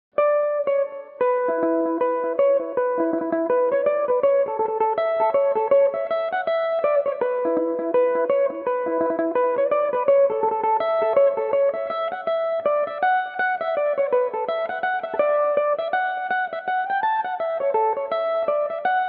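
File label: E dorian, irish mandolin, tunes; humours of kinvara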